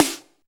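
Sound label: SD, snare drum, Dance-Kit; 90 s Sample CDs; Sound & Vision - Gigapack I CD 1 (Roland); Dance-Kit 8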